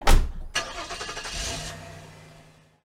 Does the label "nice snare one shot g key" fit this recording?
No